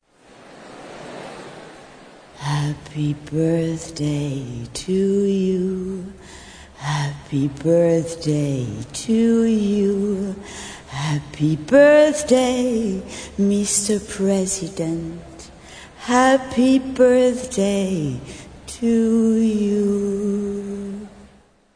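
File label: Accapella, Songs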